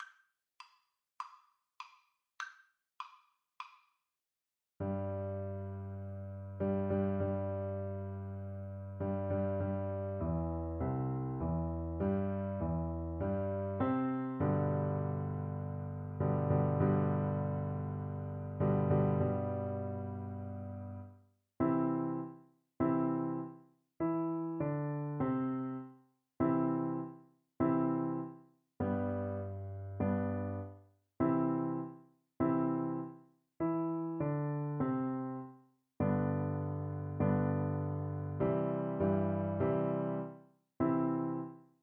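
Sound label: viola karaoke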